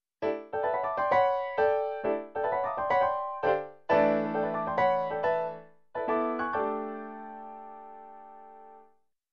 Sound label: Dissonant Piano Phrase